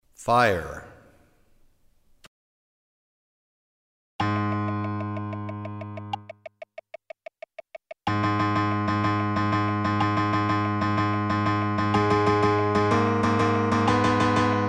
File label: Piano; Fire